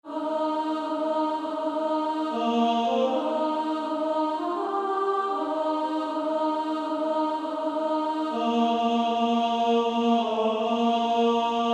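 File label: Female Choir, alto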